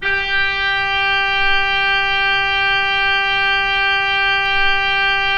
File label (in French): Orgue Eglise Reeds G5, Pipe Organ Reeds